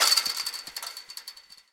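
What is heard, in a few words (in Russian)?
light metallic rattle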